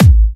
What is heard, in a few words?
Electro Kicks